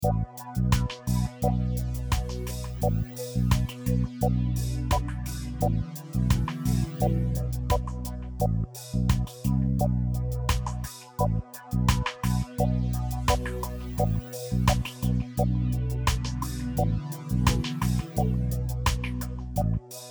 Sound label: mix unprocessed